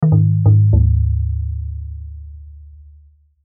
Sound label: Musical Percussive Drum Tabla; Hits; Blastwave